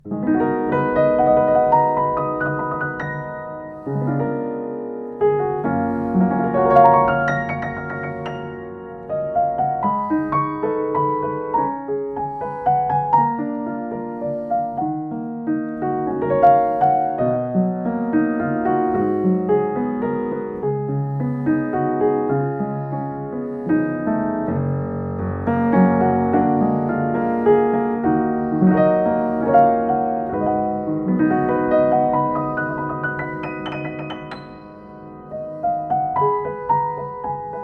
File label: Haegele 114M, piano